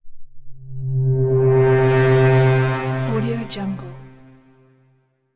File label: ufo abduction rise; asylum